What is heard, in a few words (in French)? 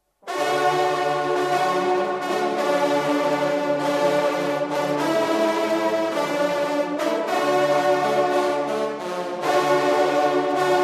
La Fanfare Des Bois